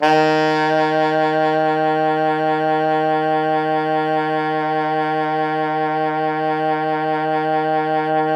Pop Brass D#2 R; Best Service ProSamples vol.19; AKAI

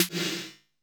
SFX S 808, TR808; GM KIT

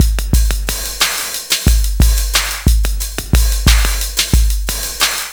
lash splash, 90bpm

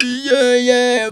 "yieaheah", Funky Vocals; USB Soundscan vol.18; TB MELODY

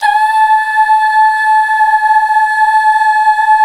DUUH FM L, Hallelujah, BACKGROUND

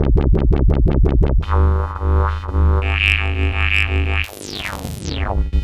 Smart Bass, Drum & Bass; Fluid Dynamics

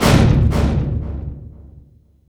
STR SNAP, String FX; Roland - LA Composer vol. 2

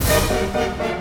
Orchestral Loops; 128 VERTIGO

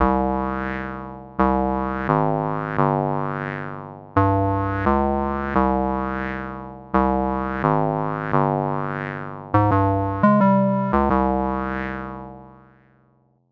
MUSICLP; neuro; Stanza

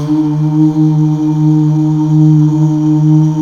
Mal Uuh, CH BACK; Best Service Gigapack 1

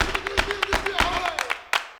120 CLAPS

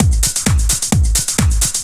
drum 130 trance

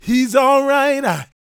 GOSP MALE, Vocal Gospel; Vocal Planet Vol1 Gospel

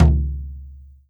drum, mixed drums, World Instruments

